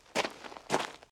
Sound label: Footsteps Dirt